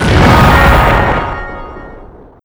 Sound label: heal nade; garrysmod